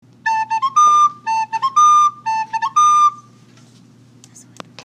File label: whippoorwill